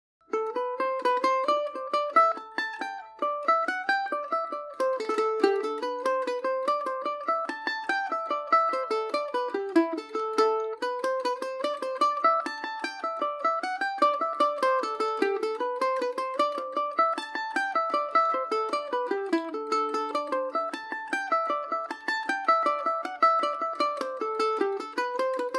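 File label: A dorian, Irish mandolin; miners of wicklow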